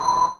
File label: JV KIT; Pro-Rec - Synthsonic; JV ANALOG2